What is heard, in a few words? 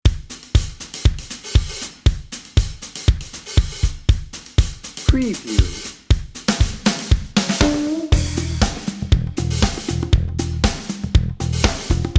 funky toes dnb